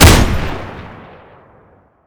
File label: nail; garrysmod